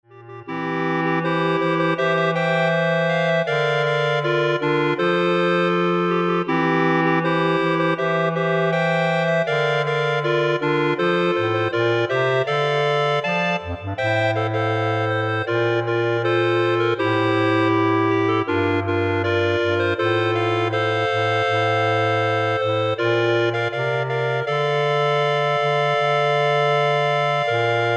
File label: Clarinet Ensemble